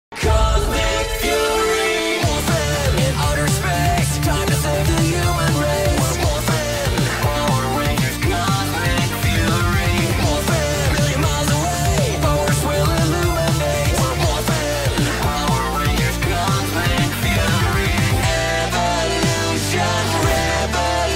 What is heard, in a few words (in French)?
musique generique vo